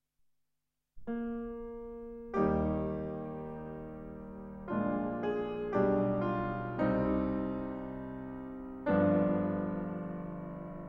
Piano Largo in E flat major; Chopin - The Piano Works CD 13

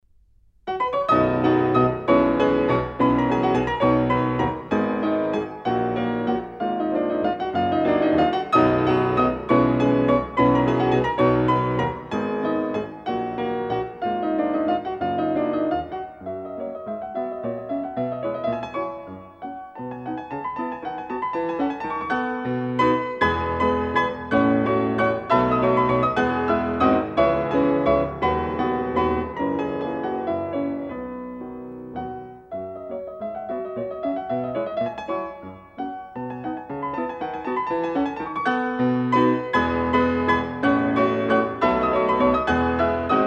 Waltzes For Piano Duet In B Major; Brahms 16 Waltzes, Op.39

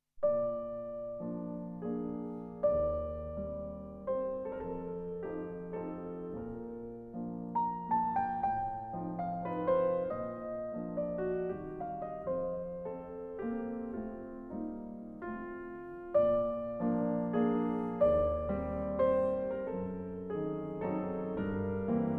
Piano Cantabile in B flat major; Chopin - The Piano Works CD 13

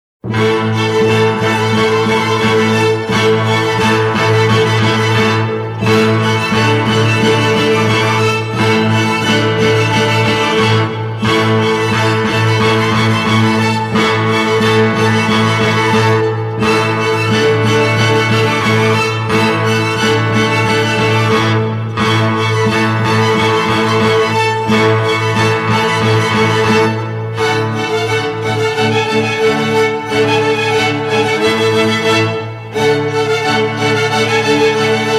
Square Dance

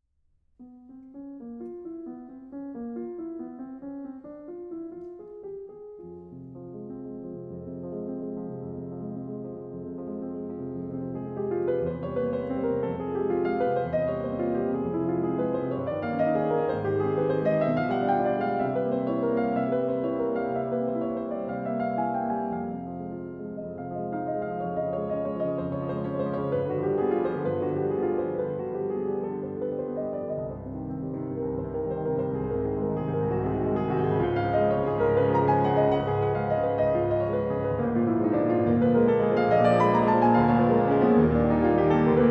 Etudes in F minor; 3 Nouvelles Etudes No.1

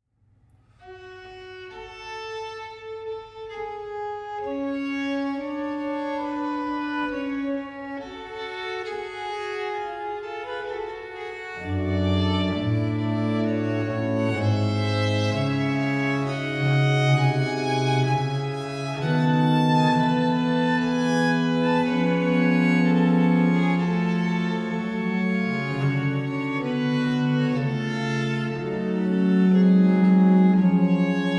Sonata in G minor, Adagio

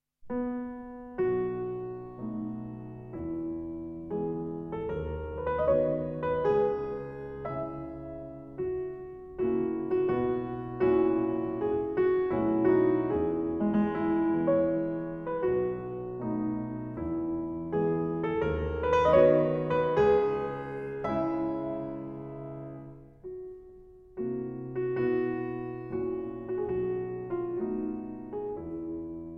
Piano Albumblatt in E major; Chopin - The Piano Works CD 13

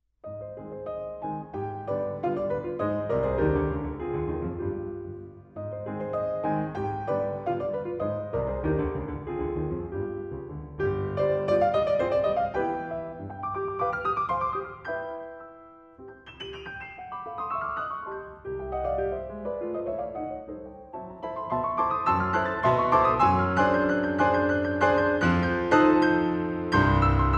Fantastic Dances Polka

